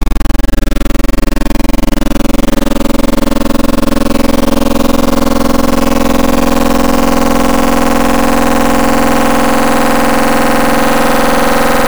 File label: Lower Fi, FM Wavetables; Cymatics; FM Wavetables Vol 2; Serum